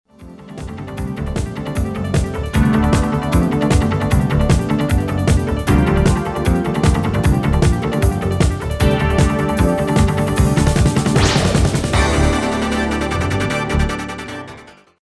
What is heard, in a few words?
musicbed sample, industrial